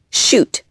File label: shoot